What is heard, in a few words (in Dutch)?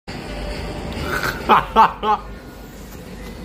lach